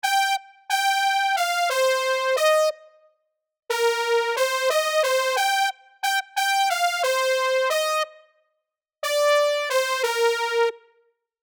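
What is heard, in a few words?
E flat 90 bpm, Soul MIDI Melody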